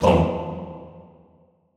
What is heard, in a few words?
TONG E1, Classical Choir; Best Service; Extended Classical Choir; SHORT TONG